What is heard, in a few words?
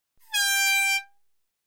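football horn sounds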